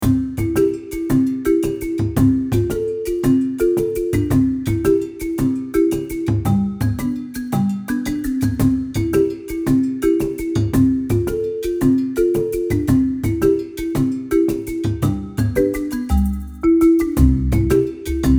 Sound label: long loop